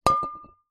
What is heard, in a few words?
glass bottle wine down gently concrete ground; foley